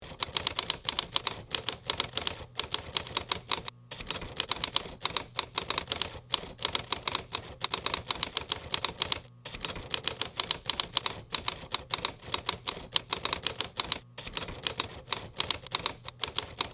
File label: typing; sleuth